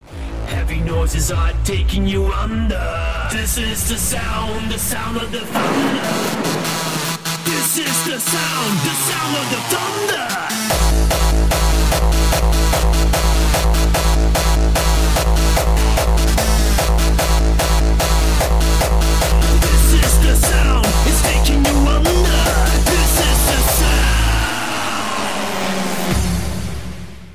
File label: sound of shofar